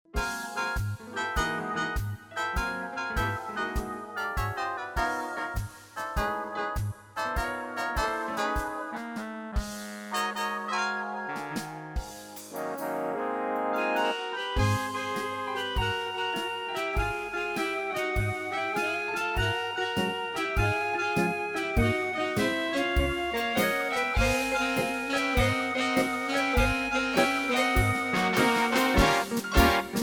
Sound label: korg pa 80